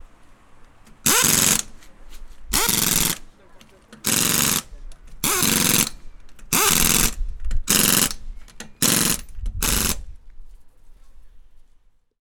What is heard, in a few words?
autorepair car wash; khotkovsky proezd; sergiyev posad